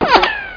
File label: P RICOCH